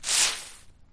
broom fallen leaves

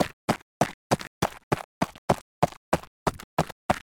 horse walk

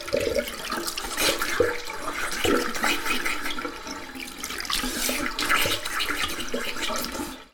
face wash

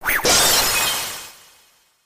magic ice

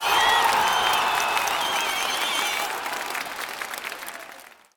people stadium cheer

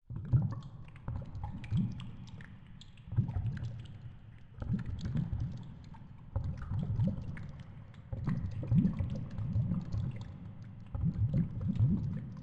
sea

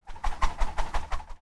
boomerang

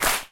ipponjime

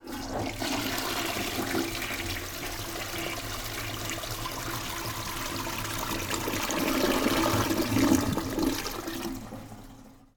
toilet

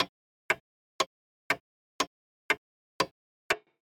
pendulum clock

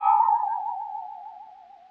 ghost

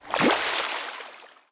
float water surface

